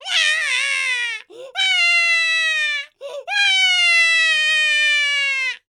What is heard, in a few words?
Baby Cry